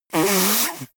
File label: nose blow